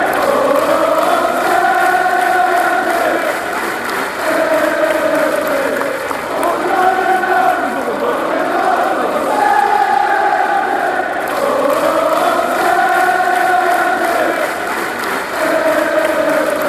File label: woah celtic